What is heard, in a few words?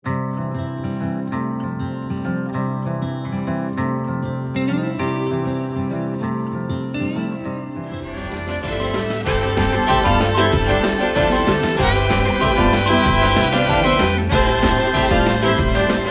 Indie Folk